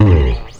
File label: FRET ROLL, Electric Basses; E-MU Producer Series Vol. 8 – Platinum Phatt